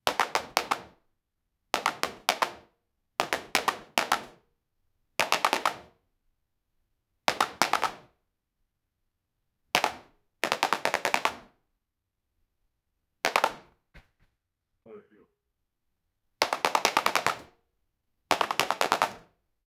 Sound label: rubber band shooting; game